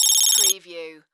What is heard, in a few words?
Satellite Zoom In; sound effects bible